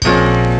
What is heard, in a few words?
Organ Chordy; Mo' Phatt; Digital Sound Factory Mo' Phatt